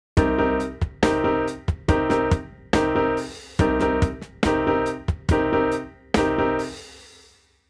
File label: funk piano rhythm chords